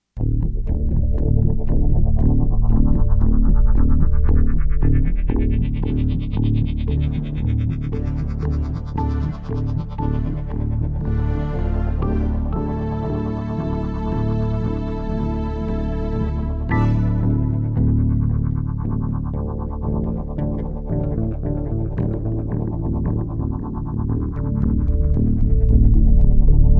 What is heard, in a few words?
bass sick start